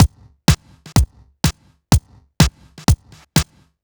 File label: Hat Party, Drum Loops, 125 BPM; Sonic Foundry (Sony Creative Software); Underground UK House & Electro